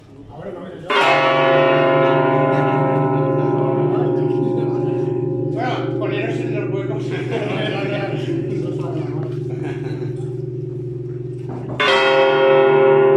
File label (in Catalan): campana